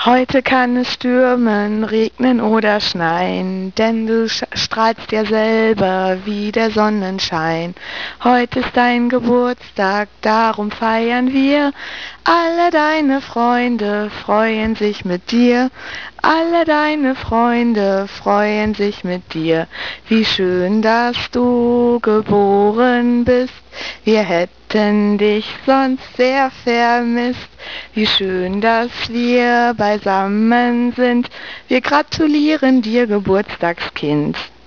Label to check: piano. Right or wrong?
Wrong